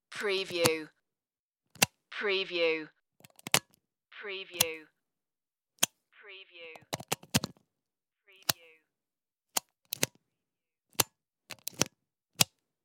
usb connection plug

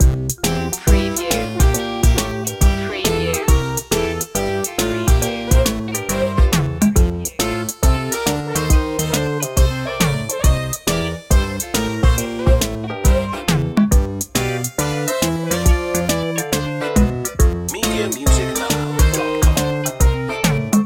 retro video game blues loop

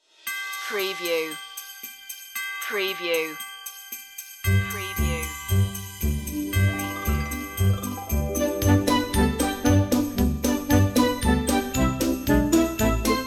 jingle bells

